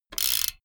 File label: bmx bike crank freewheel chopped medium